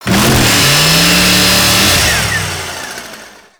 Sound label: Saw Ride Start; Warframe; Kuva Ghoul Saw